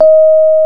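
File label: piano Rhodes Mk.V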